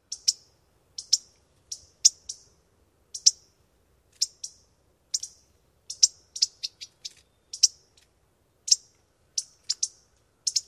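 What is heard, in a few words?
alarm chips of pair, indigo bunting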